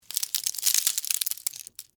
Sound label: Texture, Debris, Spill, Sugar, Cone, Waffle